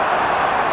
crowd; StarsLeague

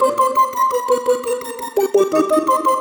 Splinkle C, Pad Loops; Cyclick Samples; Kit One

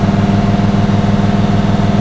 MOTOR, Slot Car

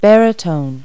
baritone; Dictionnaire Multimedia Multilingue (Home PC 42)

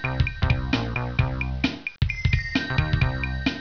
music; tzgolf2

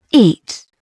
EAT; Dorling Kindersley My First Incredible Amazing Dictionary; FOOD

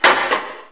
Menu Command; 250 Desktop Themes; Quake

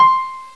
Piano (C5); X-Wing; X-Wing Patches

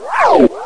starts

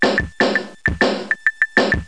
SHUFFLE; PRESKING

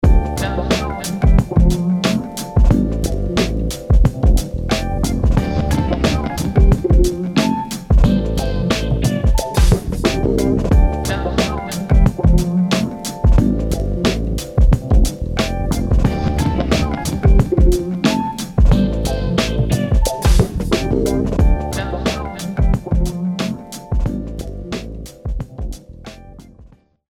Abstract HipHop; E-Lab; e-LAB MP3 Demos

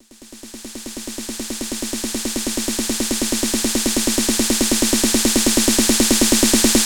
roller boy, drum

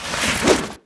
whip ready; DAoC

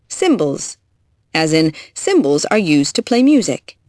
cymbals

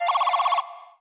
POLICE

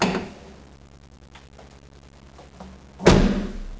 trunk, cars; transpo

